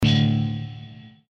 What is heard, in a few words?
fire guitar glitch; Adventure Time - Elemental; ThrillGames